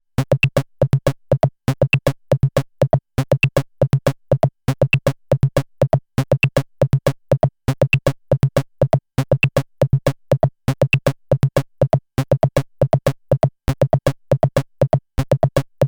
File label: randomRhythm Dice Probabilities